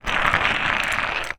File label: household flashlight metal roll ground; zapsplat